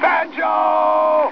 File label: banjo